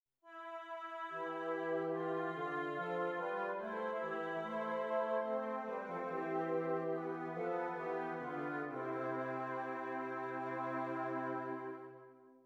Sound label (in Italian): esercizi di doppio contrappunto nella decima